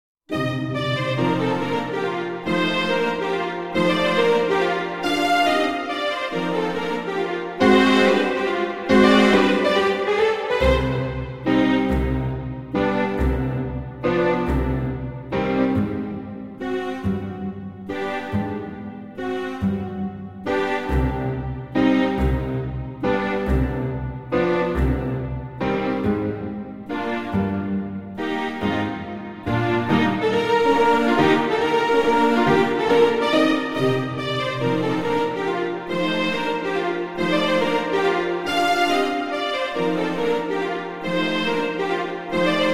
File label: mazurka minus in B